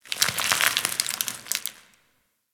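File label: Ice Crackle Random Ambience; Warframe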